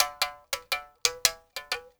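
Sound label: SM MOUTH BOW; Spectrasonics; Heart of Africa 2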